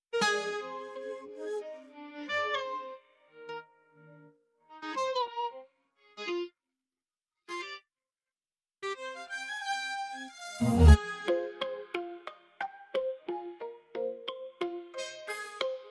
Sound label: Synth